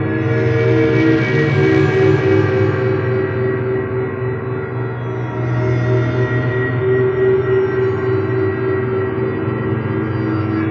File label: Spectral Chorus; Sonic Foundry (Sony Creative Software); Loop Noir